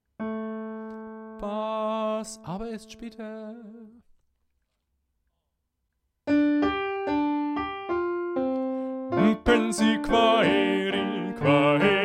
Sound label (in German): Bass mit Tutti Klavier